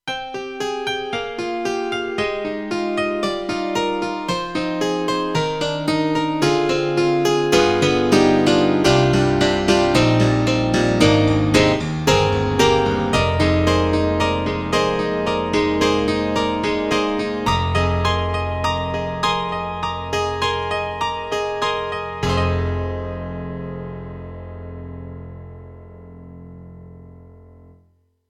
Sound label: KORG X3R HammerPno